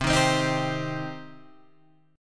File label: C Minor C down Guitar Open G